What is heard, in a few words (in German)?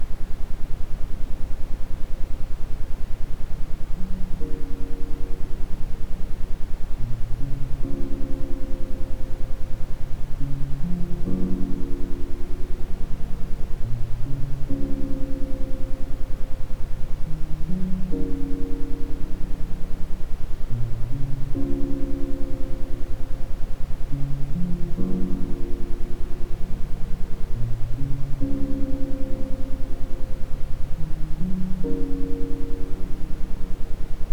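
Iso Gehirn Lernen Quickie mit Ambient Sound, Isochrone Töne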